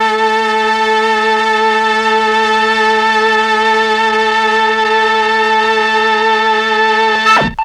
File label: MEL STR, Legendary M400; ILIO; Propeller Island